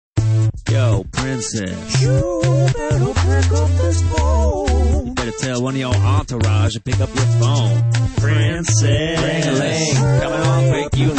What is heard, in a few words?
Is Calling Rap